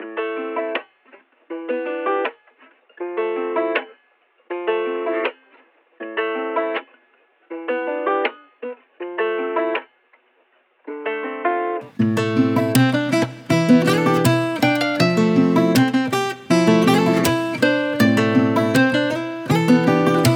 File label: 160 BPM A Min, Guitar Loops; From The Fog; Cymatics; Journey Guitar Loops